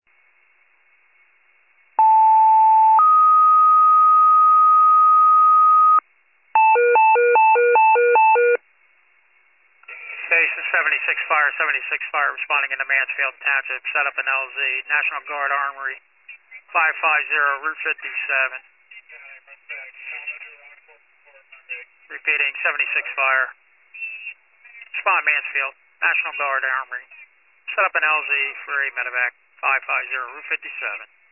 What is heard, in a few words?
FIRE pager alert